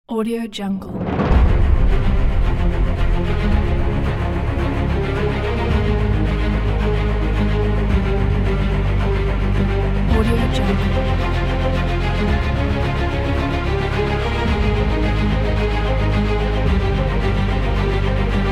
heroic patriotic epic; legatopromusic

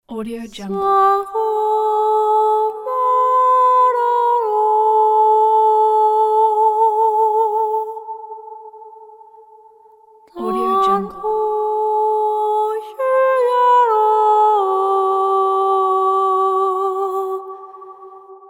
solemn gathering a cappella

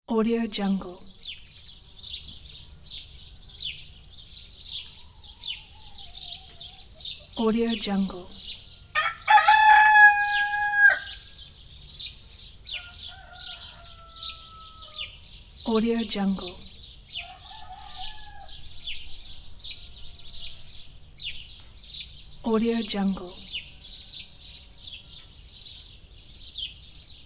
morning roosters

